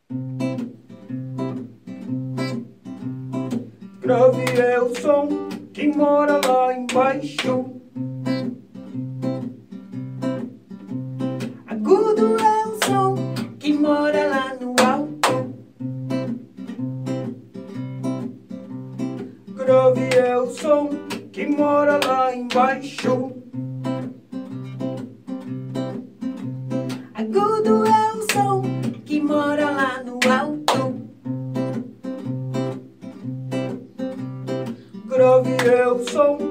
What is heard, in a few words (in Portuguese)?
grave e agudo